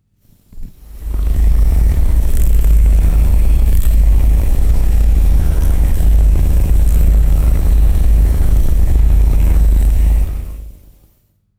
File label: low continuous moth wing vibration; animals